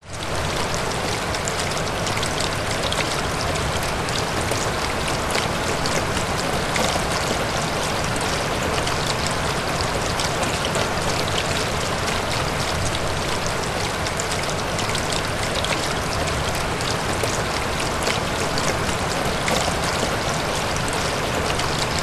nature rain flowing through gutter internal warehouse rain on metal roof above; zapsplat